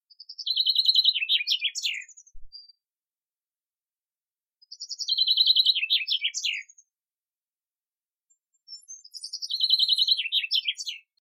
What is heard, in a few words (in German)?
Buchfink